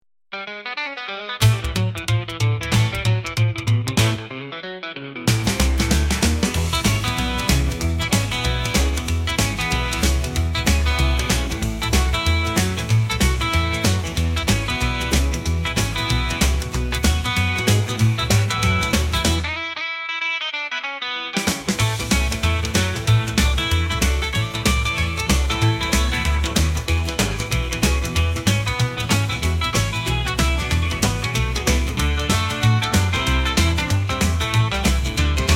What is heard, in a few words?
classic rock revival; rockabilly